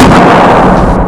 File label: gunshot; emulation; diskimage analysis